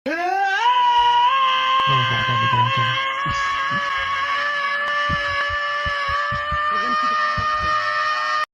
screaming; link

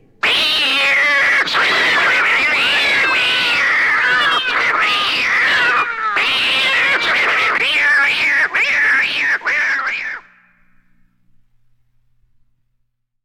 cat yowling; disney

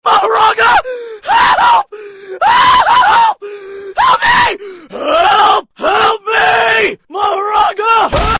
screaming for help; sukuna

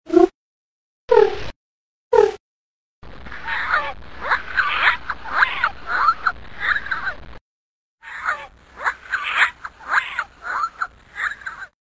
headcrab sounds

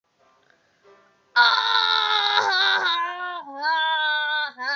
ahhhh ah ah ah

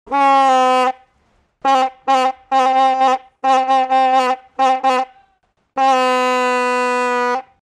stadium horn

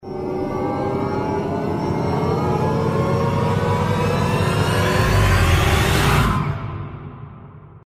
suspense gigante

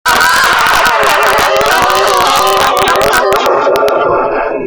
screams scary

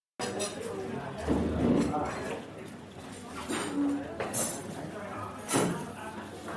dining table silverware setting

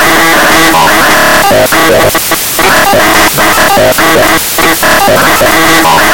jumpscare sound; fnati classic mode

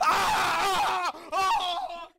rage; kancolle

